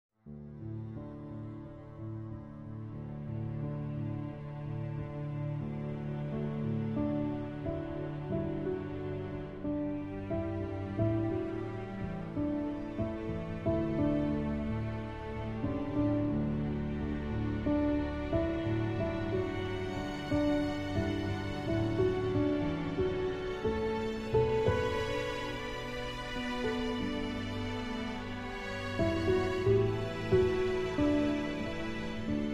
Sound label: piano